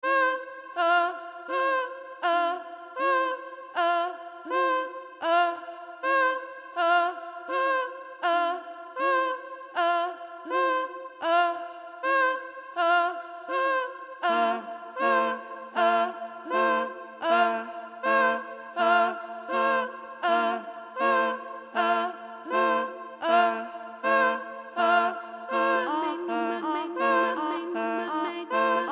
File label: 6 voices; Beacons